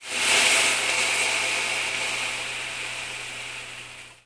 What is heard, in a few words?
pouring rice